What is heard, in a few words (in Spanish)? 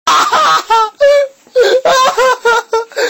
Risa Enferma